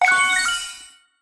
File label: secretbox active; pinball; Gacha